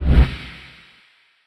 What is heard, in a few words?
swapper pickup; The Swapper